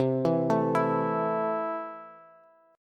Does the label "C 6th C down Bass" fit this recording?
Yes